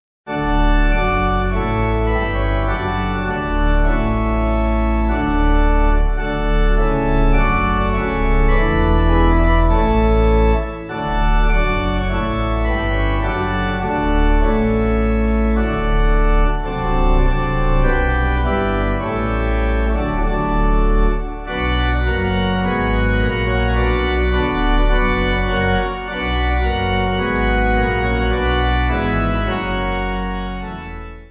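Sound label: Pipe Organ, Music Backing Files for Churches; St Chad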